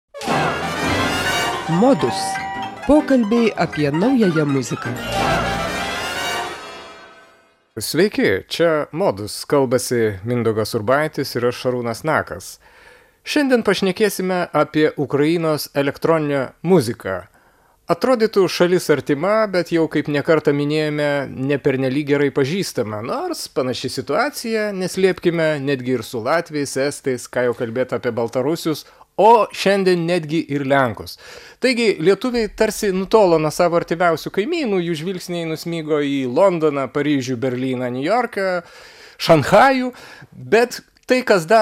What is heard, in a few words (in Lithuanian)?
ukrainos elektronine muzika